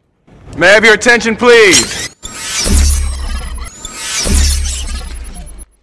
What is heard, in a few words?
neuraliser; mib